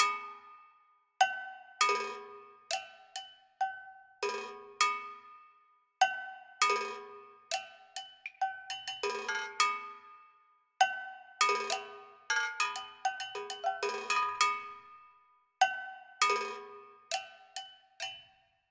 Perc; Toki Tori 2

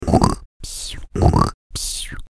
Sleep Snore; F.A.M.P.S

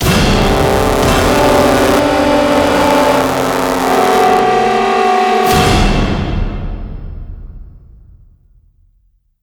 String Large

